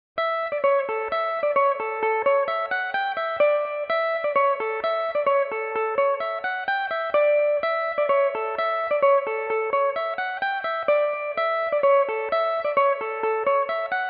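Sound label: D major, Irish mandolin; Neili's; Belmuse EM-190 electric mandolin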